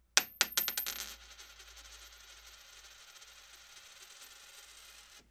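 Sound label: Big Aluminium Coin Falls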